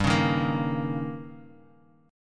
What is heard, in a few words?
Eb 7th